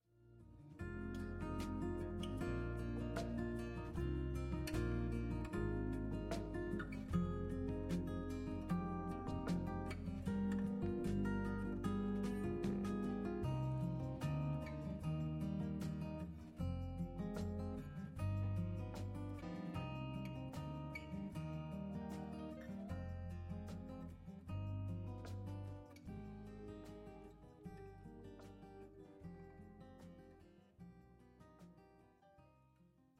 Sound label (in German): Extromusik